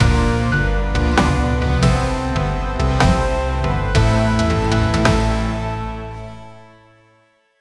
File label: tense; final destination